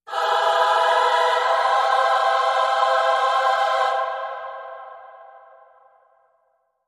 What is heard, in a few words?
Choir Only